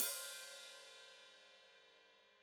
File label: Hat Foot Open, Hi-Hat; Deeply Sampled Drums